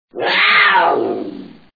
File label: jaguar, car horn